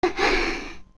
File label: Breathe; Adult Zelda